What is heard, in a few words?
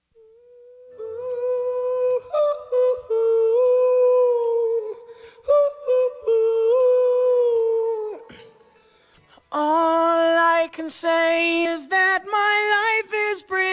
vocals